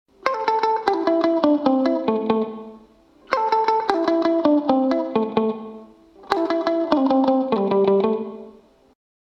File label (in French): rotatif son